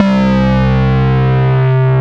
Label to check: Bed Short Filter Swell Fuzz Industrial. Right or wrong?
Wrong